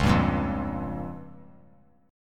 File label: Db 6th Flat 5th Db down; Db6b5 strummed; JGuitar